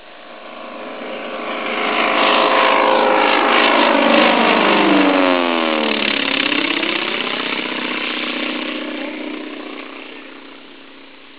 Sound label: plane flyby